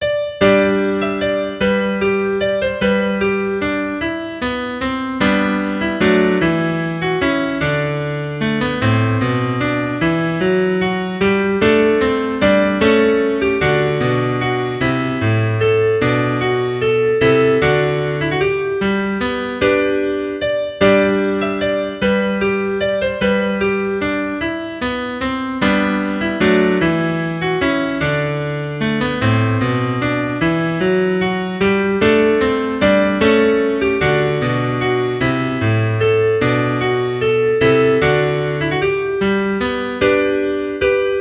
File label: Volte; Michelagnolo Galilei; Johannes Aegidius Berner de Rettenwert in Lampotin; Praha IV G 18